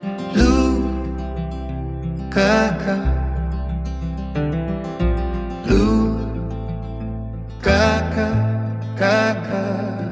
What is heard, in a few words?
acoustic